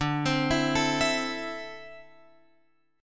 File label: D 7th Suspended 2nd down Guitar Open F; D7sus2 arpeggiated